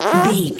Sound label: fart squirt